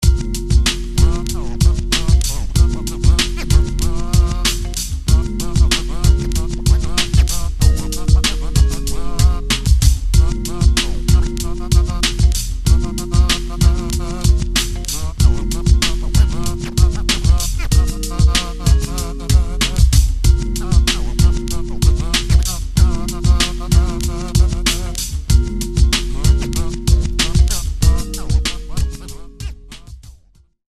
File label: bell